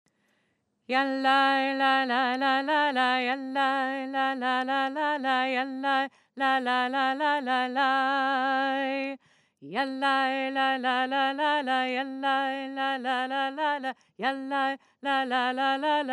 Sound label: Chassidic melody with Upbeat Niggun; LShana Habaah